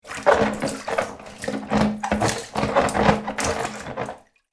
Small bath air toy soft water splashes; foley

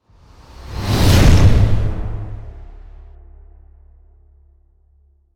Intense powerful whoosh transition

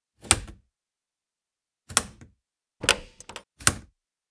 Helicopter inside interior pushing buttons

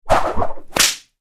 Whip whoosh and crack impact

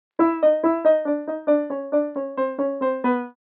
Old vintage cartoon falling

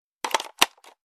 Cassette deck player portable insert tape

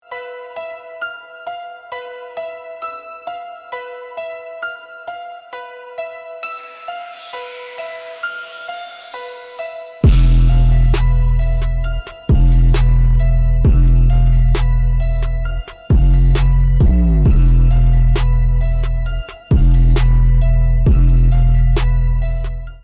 Hard hitting frequencies bassline; bass boosted

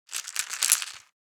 Pill container shaking pills inside; medicine

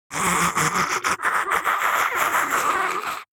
Demon beast imp monster maniacal laugh

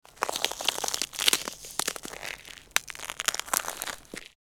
Standing on frozen lake soft ice creaks

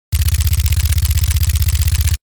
submachine gun suppressed burst; Engineer

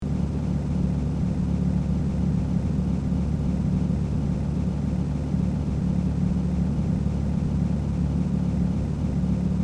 Car engine motor running driving loopable

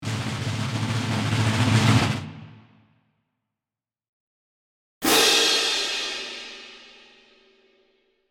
Drum rolls with cymbal crash

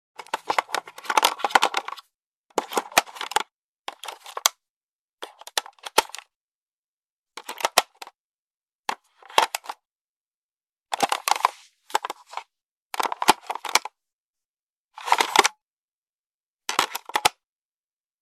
Cassette tape handling rattle close perspective

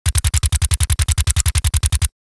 MP5 submachine gun suppressed burst; war

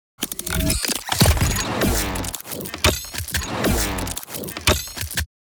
Steampunk engine mechanical process various gears

